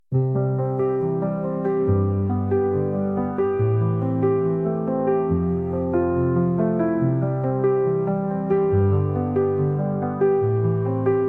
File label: Piano Logo